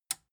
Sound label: metal button tiny push disable